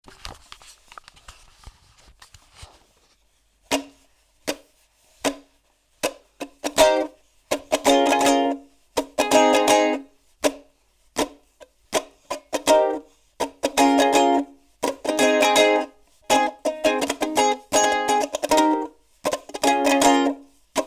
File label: Uke in black